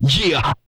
yeah, vocal, hip-hop; TB HIP-HOP

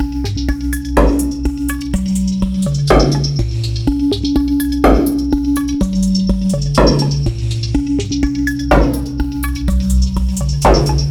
dub cut a, Loops; Polyend

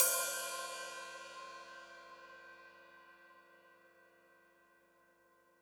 Hat Open, Hi-Hat

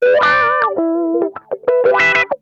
CROAK LICK, Funk Guitar; Zero G - Funk Guitar

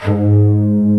LINEAR SYNTH

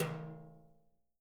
brushes, drums; Sampleheads; Peter Erskine Living Drums

